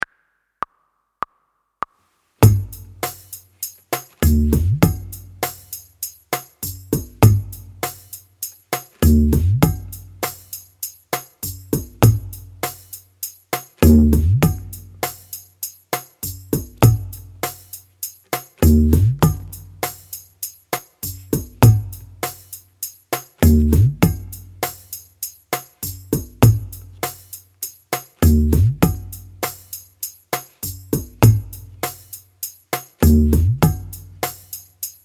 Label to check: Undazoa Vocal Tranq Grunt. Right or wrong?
Wrong